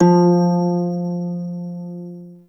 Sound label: Prime Shawzin Oct One F; Warframe